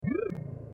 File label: spawn enemy